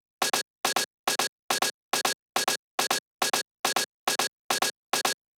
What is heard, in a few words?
drum Loop 140BPM HH; Vengeance - Essential Clubsounds Vol.5; VEC5 Drumloops; VEC5 Multi Loops 140BPM